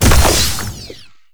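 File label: Flying Mouth Projectile Hit; Enemies; Warframe; ManInTheWall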